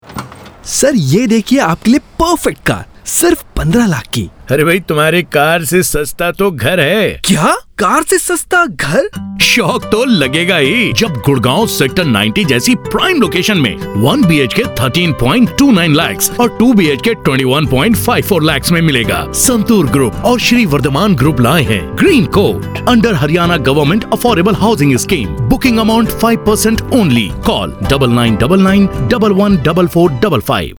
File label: santoor